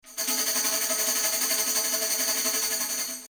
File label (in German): Tambourin 160cm back 180; Schoeps CMC6MK2S